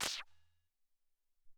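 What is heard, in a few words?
Wiz Snare, Alesis SR-18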